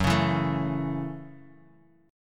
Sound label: Gb Minor 7th down; strummed; JGuitar